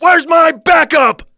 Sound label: fall; quake2; ufops